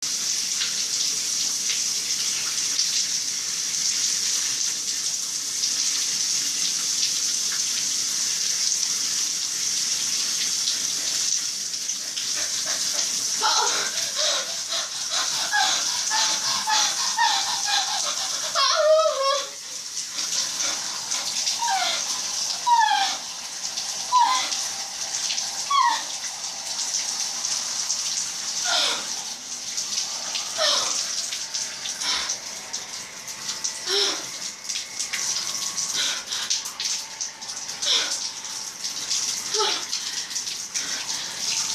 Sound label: Detachable Shower Head